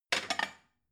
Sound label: plate cupboard take